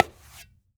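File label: high bongo; Cuba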